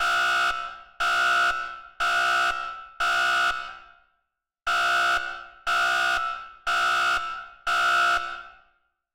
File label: alarm; Ambience; civ13; civ14